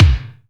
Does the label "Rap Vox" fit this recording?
No